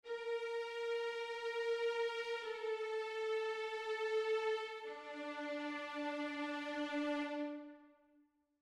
Str Violas

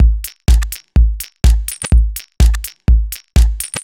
bd

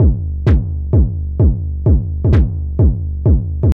ADDED CLAP

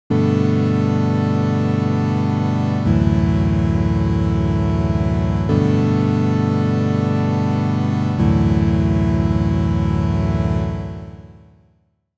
Massive Analogish

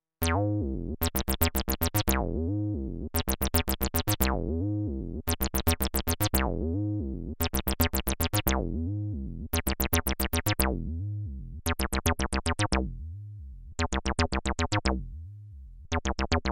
square wave

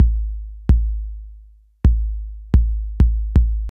miami bass

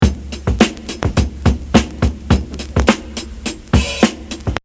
Tempo 105

